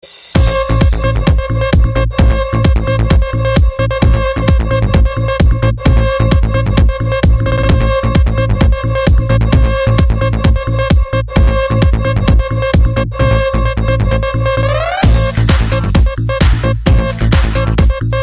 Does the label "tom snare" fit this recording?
Yes